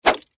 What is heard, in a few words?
bamboo stick swing whoosh rattle; UltimateFishing